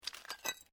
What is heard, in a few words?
Glass move quiet; Material components for constructing more complex sounds; Materials